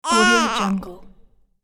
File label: newborn baby hungry cry